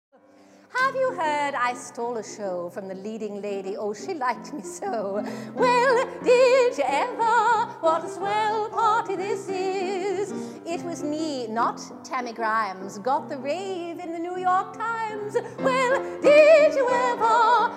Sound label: swell party